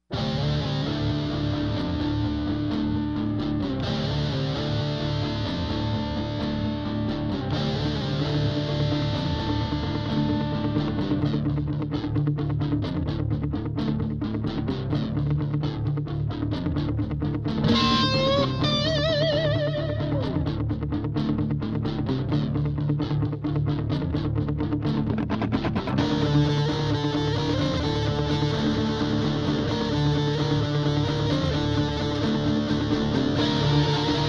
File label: guitars only